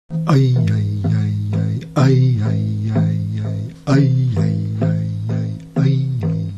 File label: drum chant